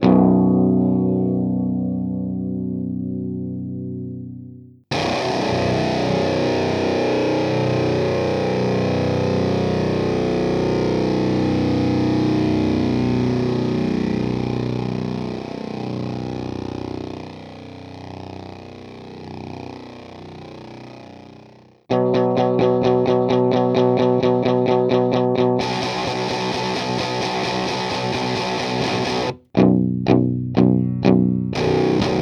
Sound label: geiger counter